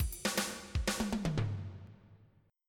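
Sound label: swing break, drum; Sounds Terrific II; Weird Science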